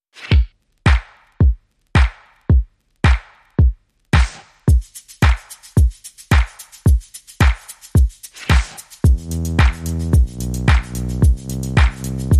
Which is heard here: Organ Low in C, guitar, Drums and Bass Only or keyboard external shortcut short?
Drums and Bass Only